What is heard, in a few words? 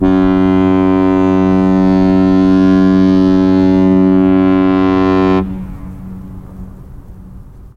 corne de brume foghorn